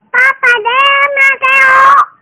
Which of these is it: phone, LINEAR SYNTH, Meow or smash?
phone